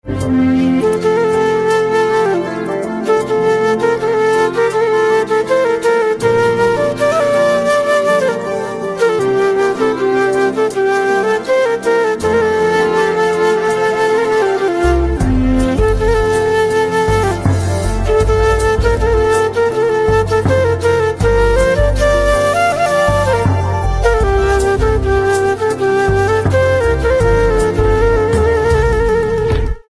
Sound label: Flute Instrumental Ringtone